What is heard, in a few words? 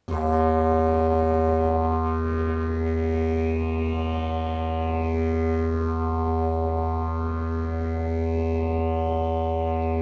Didgeridoo